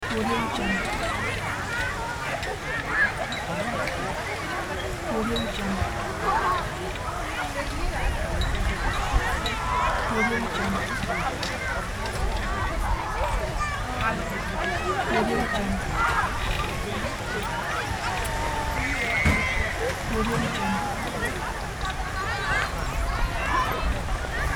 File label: beach people summer sand sunshine busy